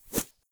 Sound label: Arrow Fly By